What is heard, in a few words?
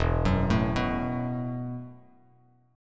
E Suspended 2nd Suspended 4th E, Bass Standard